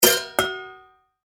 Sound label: Household Pots Movement Pull Out From Cupboard; Kitchen